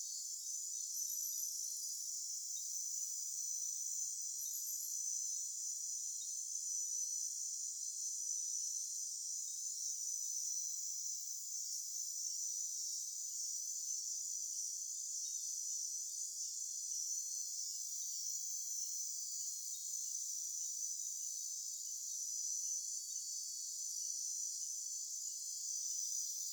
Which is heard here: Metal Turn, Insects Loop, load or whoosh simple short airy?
Insects Loop